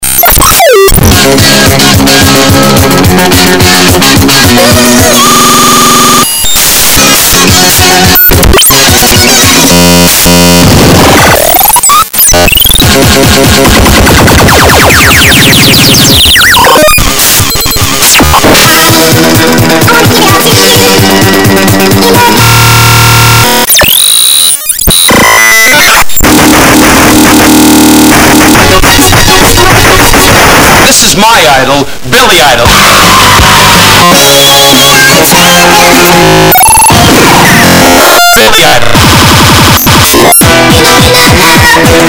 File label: noise; thirtytwobit